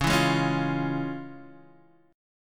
F Minor Major 7th Sharp 5th, C sharp

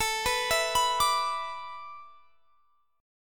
A Suspended 2nd Suspended 4th; Asus2sus4; arpeggiated; x,24,21,21,24,22

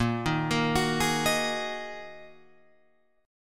Bb 7th Suspended 4th Sharp 5th Bb Guitar Open F; Bb7sus4#5; arpeggiated; 10,10,10,13,11,10; JGuitar

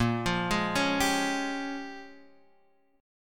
A sharp Minor 13th A sharp down, chord; arpeggiated; JGuitar

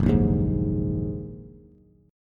Gb 6th Add 9th Gb down Bass; strummed; Gb6add9; JGuitar

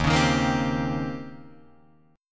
D sharp 13th, Guitar, Drop D

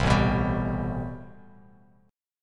A-Minor 9th A Bass Standard; strummed; JGuitar

